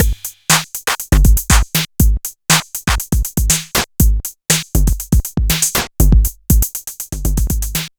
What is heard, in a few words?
HIPHOP; Wizoo - Platinum 24 Electronic; DEMO KIT